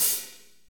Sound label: HAT, Roland Fast Rock Hi-Hats; Northstar; Drumscapes Roland